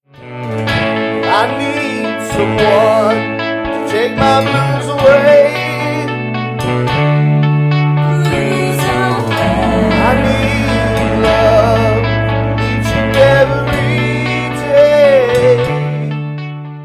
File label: Blues